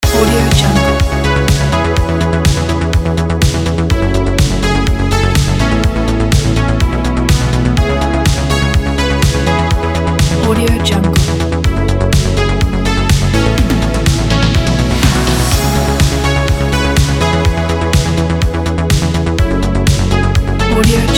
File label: synthwave retro melodic 80s loop; boxbeats